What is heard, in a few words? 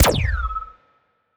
healing muzzle, weapons; Stellaris; biogenesis